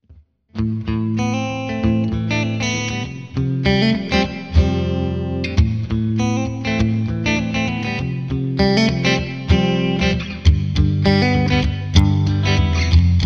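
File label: twin clean clean, guitar pickups; P90; Neck/Bridge 1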